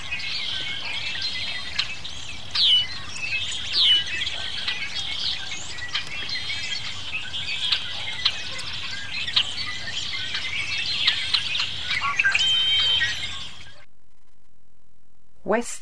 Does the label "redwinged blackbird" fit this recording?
Yes